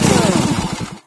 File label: shut down; There; ThereClient; bacio